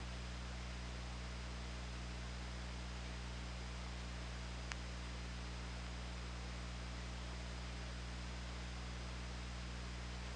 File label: OrcaLab